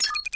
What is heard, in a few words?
CAM FOCUS, camera; RED Hydrogen One